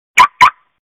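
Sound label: put on the alarm sound the car makes on the alarm; postavil-na-signalku-zvuk-kotoryy-izdaet-mashina-na-signaliz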